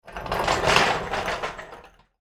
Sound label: Engine Hoist Roll, Industry; Motors; Machines; BlastWave